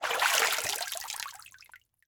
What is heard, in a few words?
Liquid Water Water Hand Rise From Water Drip